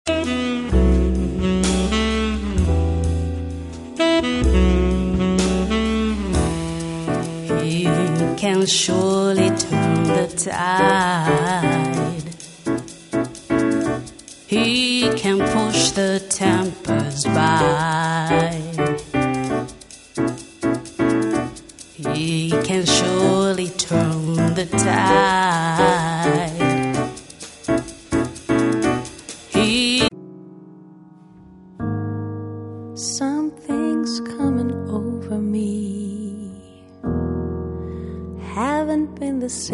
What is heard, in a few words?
rejazz